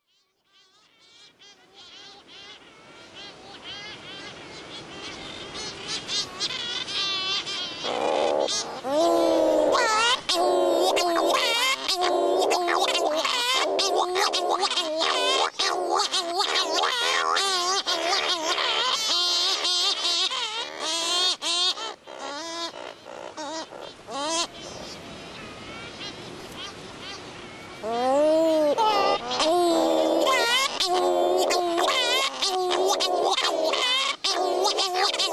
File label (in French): cagarro, Acores